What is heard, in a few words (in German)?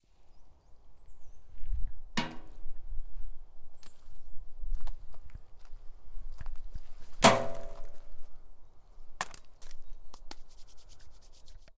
Tonne Beschmeissen